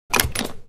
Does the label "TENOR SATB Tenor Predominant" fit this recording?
No